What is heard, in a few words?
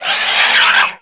hurt Plant